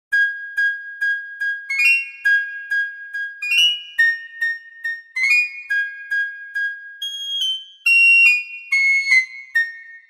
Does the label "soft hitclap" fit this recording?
No